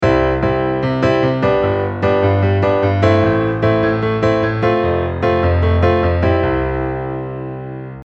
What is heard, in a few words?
8 beat